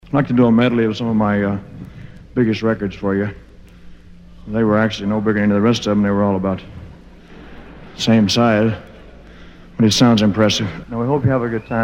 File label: Medley; Don And Mike